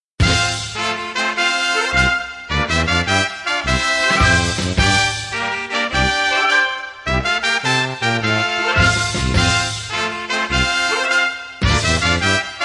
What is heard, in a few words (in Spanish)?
san antero himno